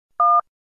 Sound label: Telephone dial tone